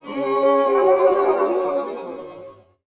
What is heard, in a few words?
Mech Death Vox